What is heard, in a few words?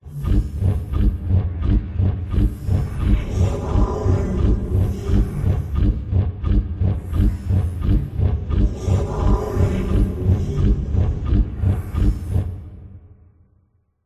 robotic power surge sci fi robotic robot cyber futuristic energy machine drone